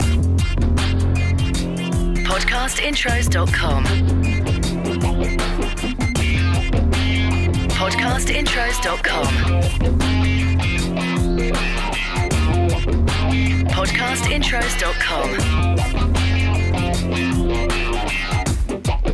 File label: Podcast Watermark HIP HOP